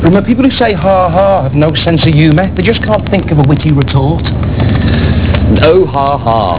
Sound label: haha